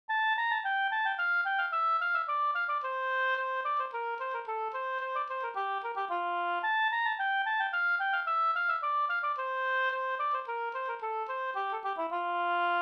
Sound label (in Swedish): polska Bingsjopolska Brannvinspolska; e Pekkos Per